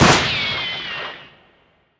tornado; Wild Guns

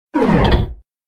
UI AUTO STOP; games; Dazzling Diamonds

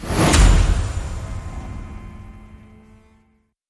text sfx; Wild Ape

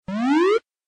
GAMBLE WIN; Helena

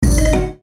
stop bonus; games; Book Of Lords

